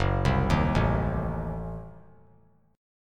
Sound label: F sharp Diminished 7th F sharp; arpeggiated; 3C,3F,3A,3D; 6,7,6,7